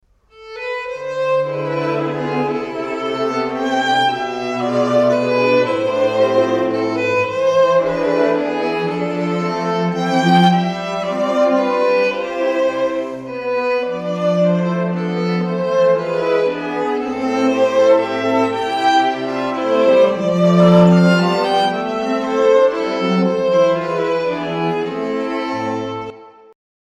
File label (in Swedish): vals; tavelsås